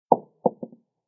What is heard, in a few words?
Ball Drops